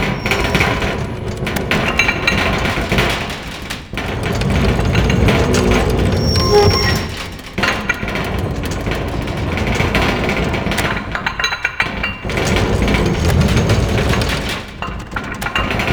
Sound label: Door Malfunction Loop; Ambience; Props; Warframe; Tau; Circles Of Hell